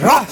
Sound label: growl male